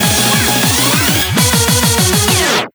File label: Bgm Reach